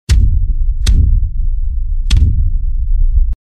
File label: sticky creature footsteps